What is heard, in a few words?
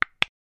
click button